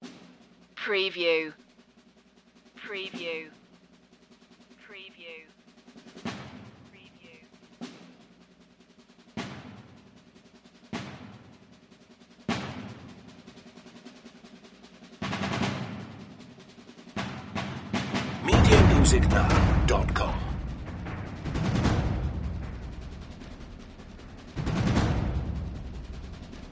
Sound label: perc mix